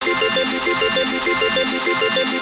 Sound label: collect; Gem Star